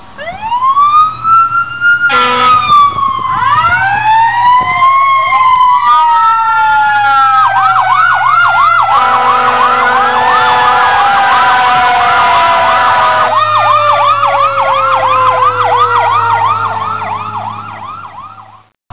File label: fire truck